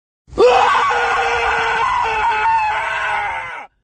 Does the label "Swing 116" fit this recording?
No